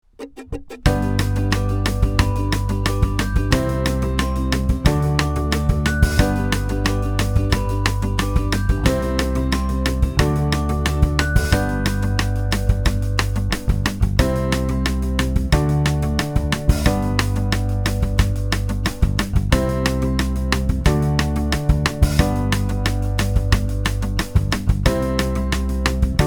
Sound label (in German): Eine wunderbare Zeit PLAYBACK; Sonnenrain Radolfzell; GS Sonnenrain